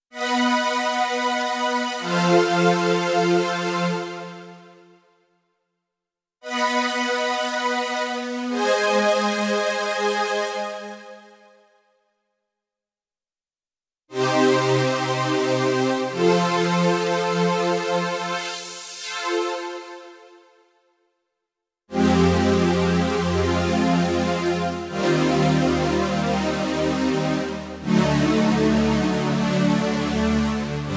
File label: PWM Strings, Korg Radias-R